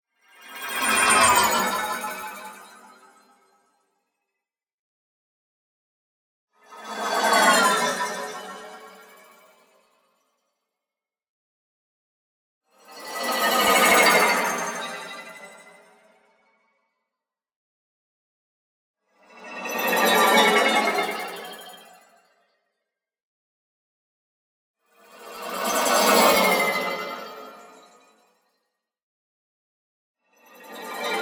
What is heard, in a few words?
NEON WHOOSH SMALL SLOW Fluorescent Dopplers; boom library